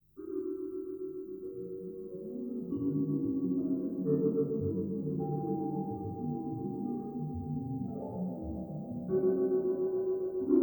Backing track

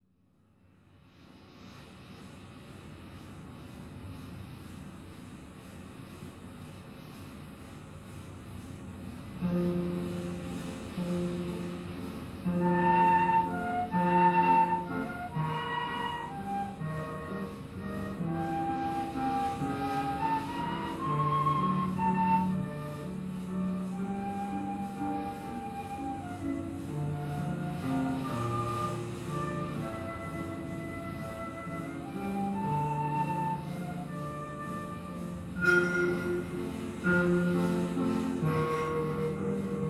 Backing track

